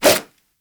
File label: nunchuck whoosh; Warframe; Infested; weapons